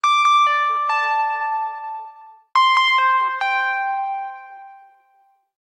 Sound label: Muzzy tone; Samsung SCH-W420; ringtones; Simple Bell